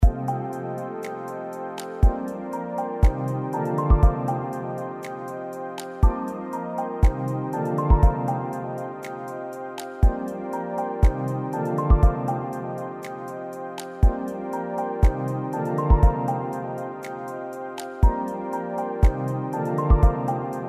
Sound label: AMBIANT